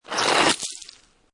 Snow And Ice Ice Or Snow Scrape With Debris; Weather

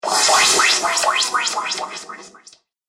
Magic Laser Spinner, laser sound; Laser Sound Sound Effects

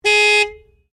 Horn Long Exterior, car; Hyundai Accent 2008; Hyundai hire car; Vehicles

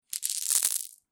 Marbles Or Pebbles Rattle; Several marbles or small pebbles being dropped.; Miscellaneous Toy Sound Effects

